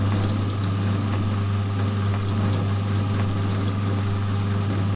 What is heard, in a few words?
concrete mixer; builder